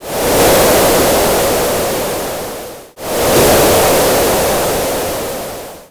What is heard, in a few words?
wave